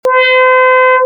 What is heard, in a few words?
chowning Brass; FSound